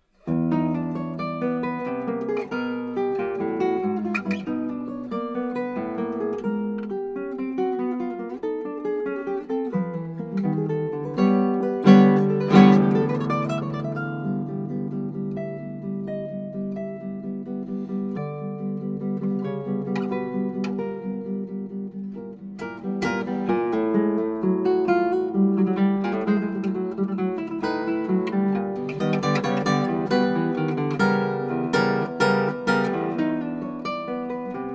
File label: gigue